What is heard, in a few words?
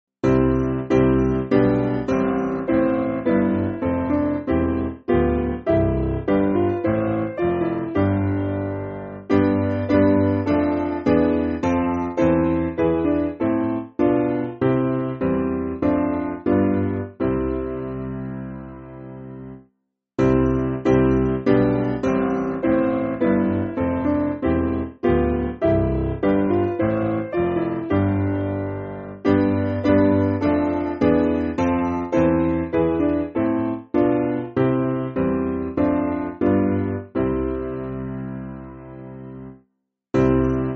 Westminster, Piano; Simple Piano; My God How Wonderful